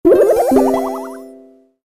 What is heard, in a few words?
player heal